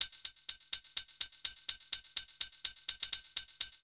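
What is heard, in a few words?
percussion, house, 125, drum; eJay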